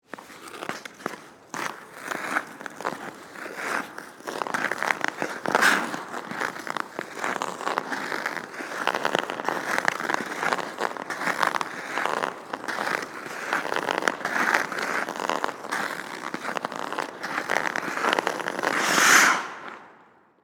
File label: Ice Skates Follow, With, Mic, Circle, Indoor, Ice, Rink; Sports; Ice Skating Sound Effects